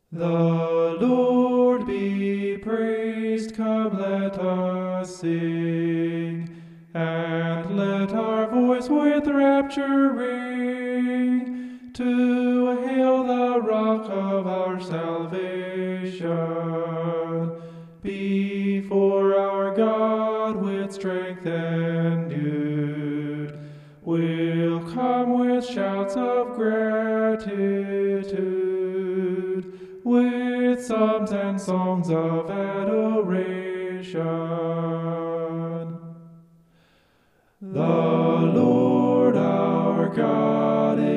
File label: vocal homoph; psalm 95